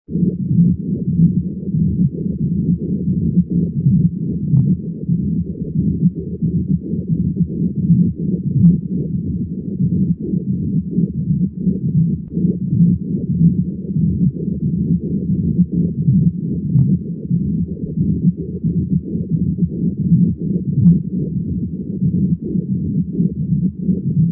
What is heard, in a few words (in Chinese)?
心包摩擦音